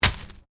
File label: ORGANIC PUNCH; Various Organic Foley sounds; Combat Swings; Sound Effects:Combat; Foundation3